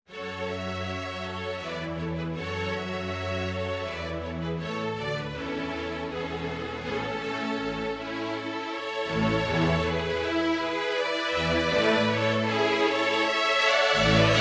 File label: ballet-pantomime, MIDI version; ninette a la cour